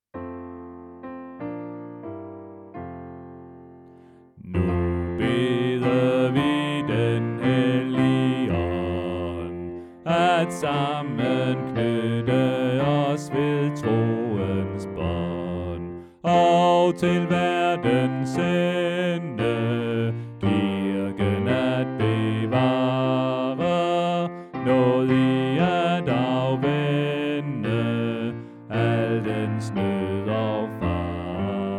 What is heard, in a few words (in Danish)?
Bas; oevefiler